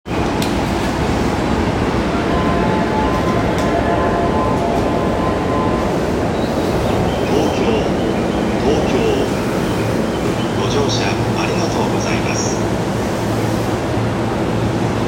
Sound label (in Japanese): 京浜東北線東京北, train sounds